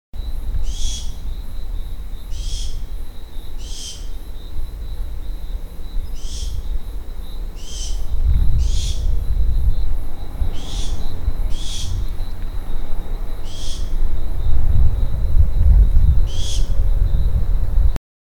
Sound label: Lesser Antillean Barn Owl rasp call; Michael Akresh